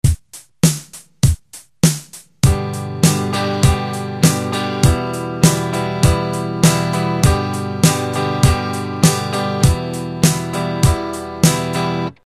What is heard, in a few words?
strum normal, guitar